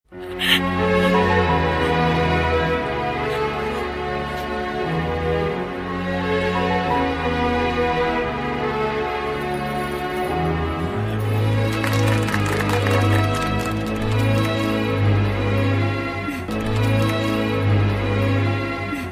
Bgm

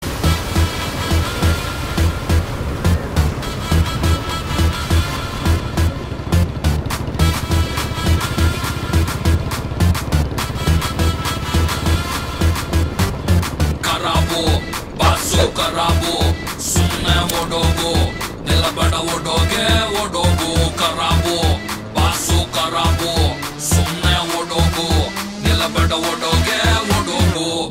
Starting Ringtone

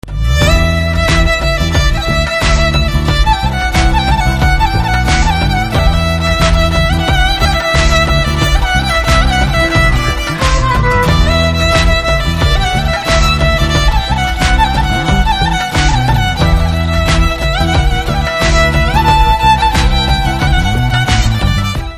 BGM Guitar Ringtone

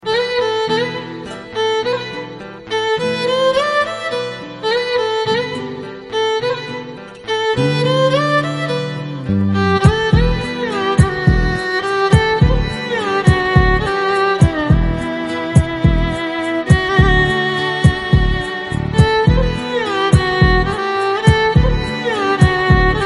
Violin Ringtone

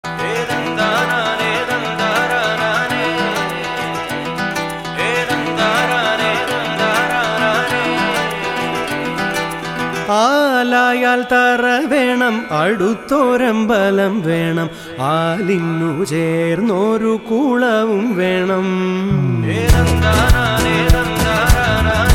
Solo Ringtone

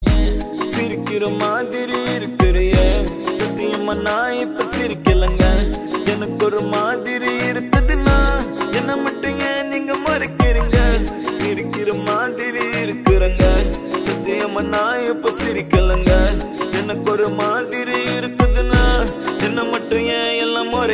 BGM Ringtone

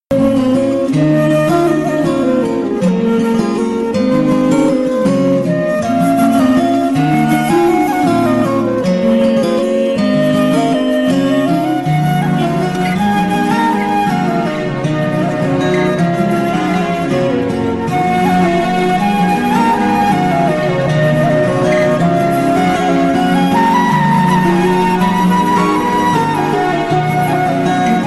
Love BGM; Maryan

